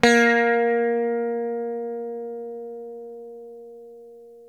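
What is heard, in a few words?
SITAR A#2 right, coral sitar, guitar; East West; Steve Stevens Guitar Samples Collection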